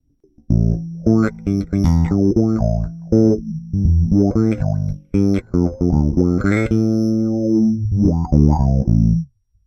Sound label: Waldorf 2 Pole Bass Fast LFO Sweep